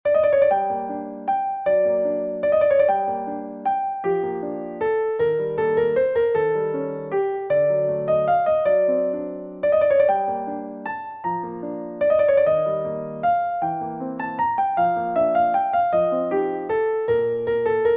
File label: Arabesque in G minor